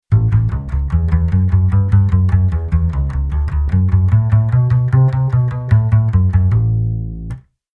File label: Bass Arr Rock n Roll Bass